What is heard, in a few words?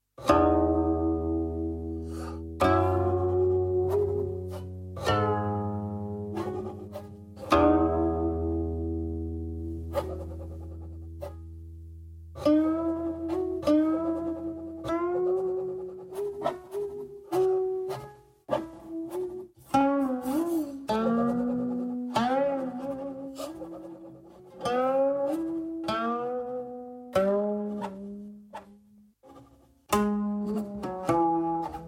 guqin; 古琴数字化采样之演示曲; 明代“秋籁”琴; NExT Studios音频设计团队; tencent